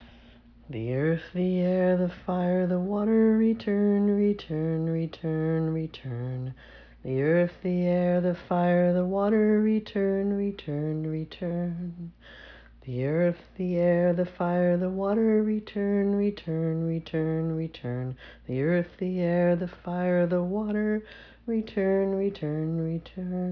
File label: The Earth The Air The Fire The Water Sung, chants